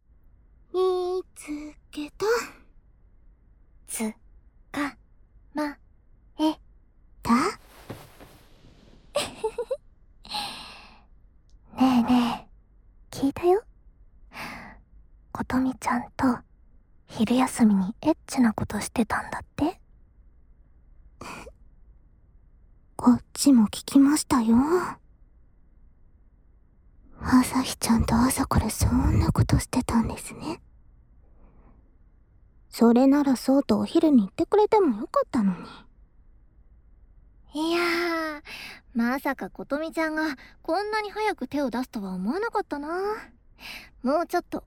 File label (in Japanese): 両耳舐め, ASMR; 誘惑！?